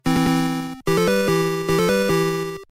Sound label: Level Complete